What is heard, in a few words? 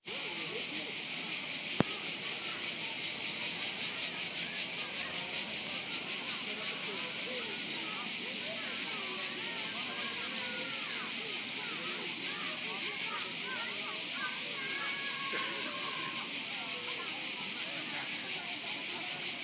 cicadas; the interaction of sound ws